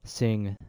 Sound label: sing